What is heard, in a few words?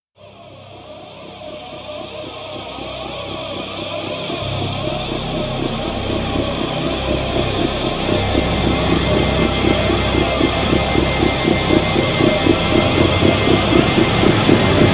Rise Viper; Brand X Music; Brand X Music Catalogue - Editor's Essentials